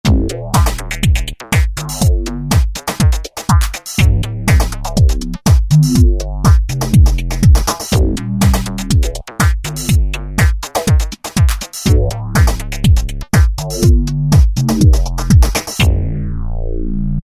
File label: Phased House, Korg ES-1 Factory Patterns